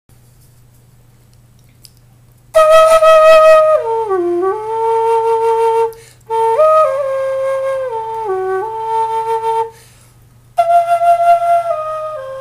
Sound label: quena